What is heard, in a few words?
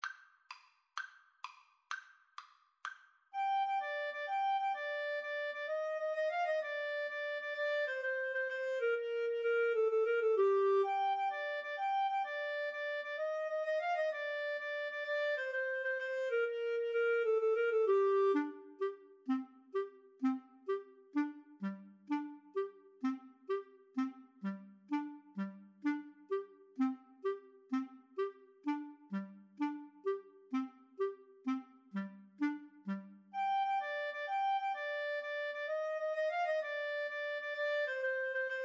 tarantella, Clarinet Duet